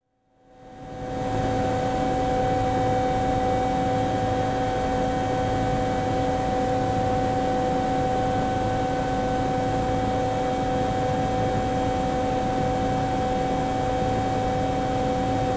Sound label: Helicopter in flight from cockpit